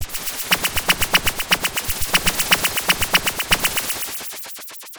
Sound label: drummix 8Hz sine